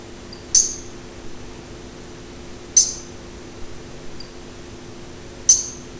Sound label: Stumpffia analamaina